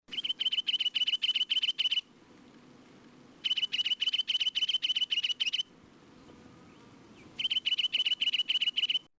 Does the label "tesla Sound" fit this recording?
No